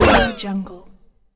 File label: raced past; wildemotion